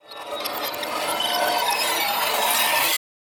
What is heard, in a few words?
production element transition glitch; sonniss gdc